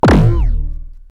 foley synthesized robotic impact bass

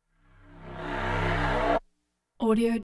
synth bursts low end cheesy rizzy buzzy

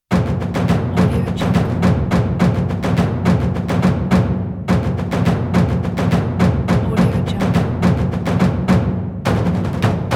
taiko massive ensemble

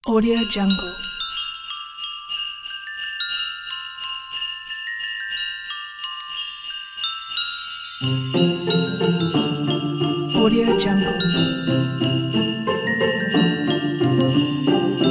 we wish you a merry christmas

